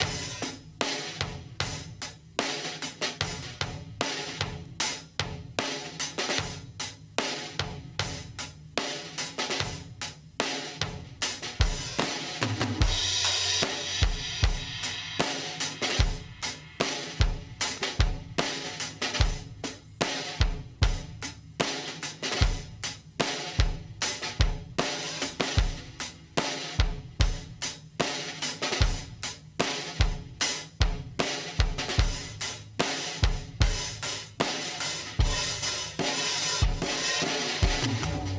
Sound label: Indie Thing 75 bpm, drums loops; BASEMENT DRUMS VOL.1